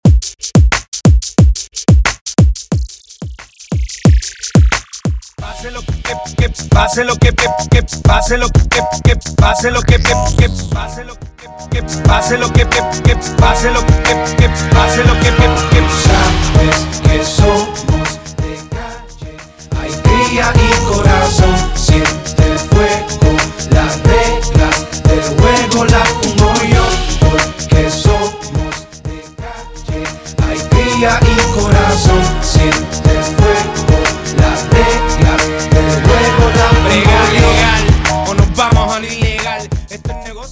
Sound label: intro 90 bpm